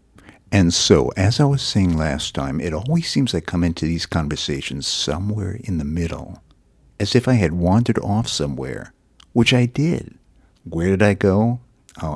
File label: train ride With Vocals